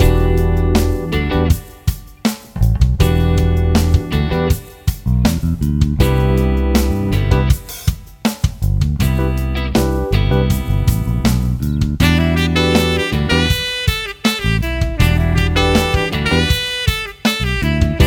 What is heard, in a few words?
jazz; Time Tenshi 2